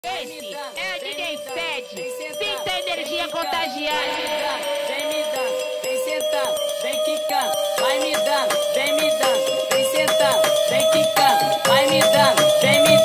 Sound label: Phonk